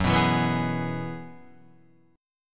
Gb 7th Suspended 2nd Suspended 4th, Guitar; strummed; JGuitar

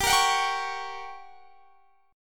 G Minor Major 7th Flat 5th, G, down; GmM7b5; strummed

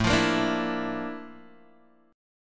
Bb Major Sharp 11th A, chord

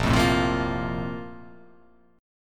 Bb Major 7th Suspended 2nd Bb down; BbM7sus2 strummed; BbM7sus2 chord; JGuitar

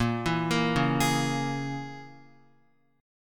Bb Minor 7th Flat 5th, Guitar; Bbm7b5 arpeggiated

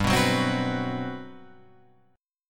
G Major 11th, Guitar Open F; strummed; JGuitar

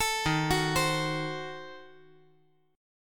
Eb Diminished 7th Eb; Ebdim7 arpeggiated; 14,15,14,15; JGuitar

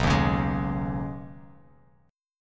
B 6th Add 9th B down Bass Standard; strummed

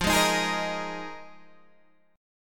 Eb Suspended 2nd Suspended 4th

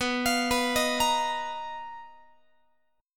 B Major 7th Flat 5th B down Guitar; arpeggiated

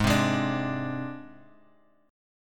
Ab Major Flat 5th, Guitar, Open G

